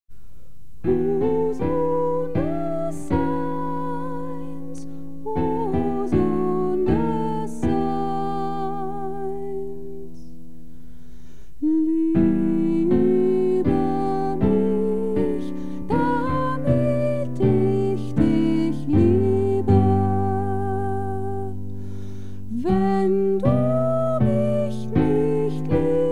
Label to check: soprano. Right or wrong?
Right